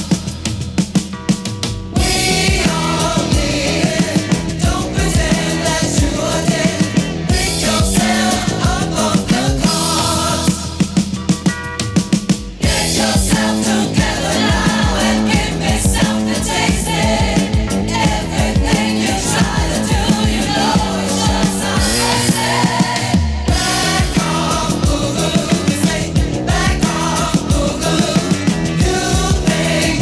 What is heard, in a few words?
disco